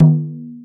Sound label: Tubano